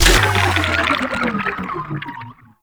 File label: Purify Machine Shutdown; Ambience; Warframe; Infested Eris; Encounters